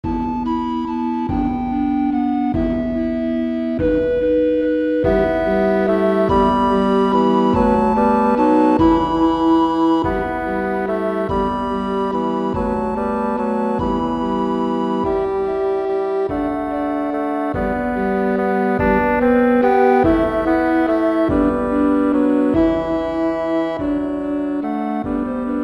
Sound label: Mini Bassoon Track with Melody; We 3 Kings